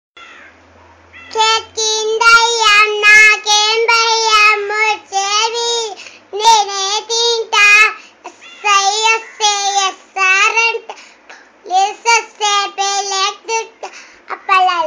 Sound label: Village Girl Rhyme